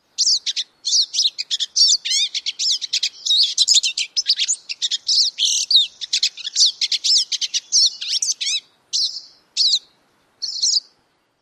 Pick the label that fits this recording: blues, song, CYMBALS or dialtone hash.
song